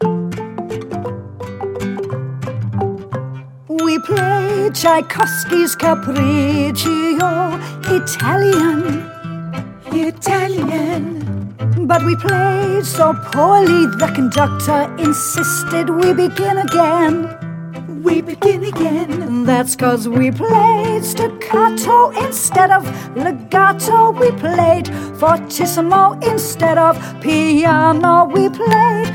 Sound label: Cappricio Vocal